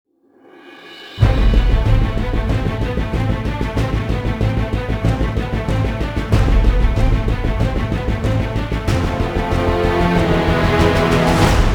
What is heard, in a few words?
no choir